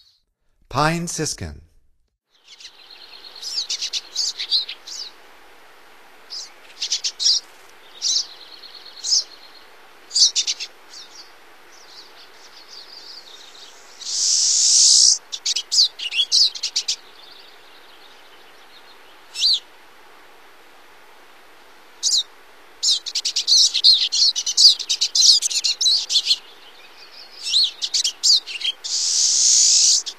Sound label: Pine Siskin; North America; Stokes